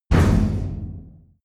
big metal impact short

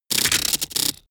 sci-fi electromechanics small stutter

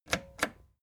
record player play click slow

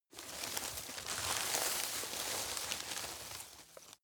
grab rustle medium

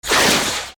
agressive swoshes high short

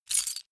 key ring large place hard

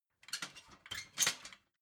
ladder metal handling short

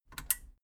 metal button radio push power off

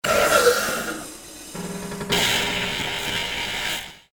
hydraulic door

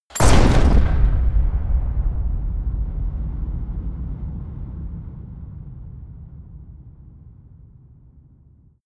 shotgun fire huge reverb bolt

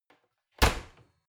oven close

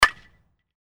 bat swing

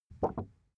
cardboard wobble short medium

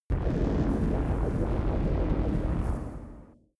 space inside biomedical alien spaceship

